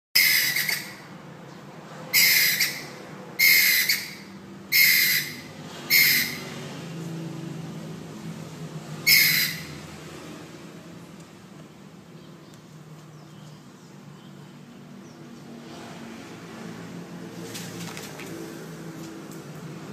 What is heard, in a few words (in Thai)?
ร้อง